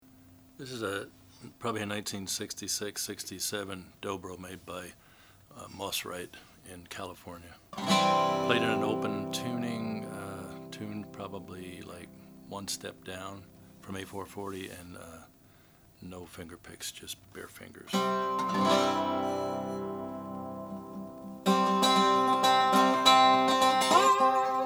Dobro Columbia Model D12 Mahogany, Guitars 12 String Electric & Acoustic; DOBRO 'The Columbia' 12-String c 1966